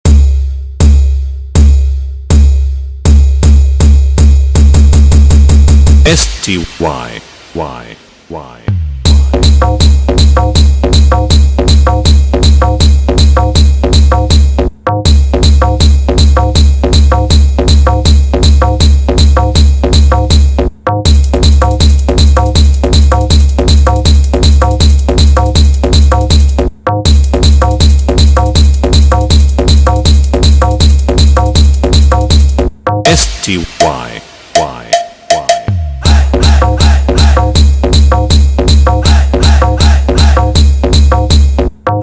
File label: Bass And Vibration Dj Beats Trance Music